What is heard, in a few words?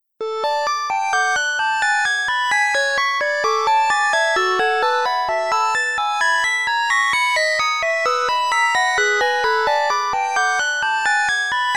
only melody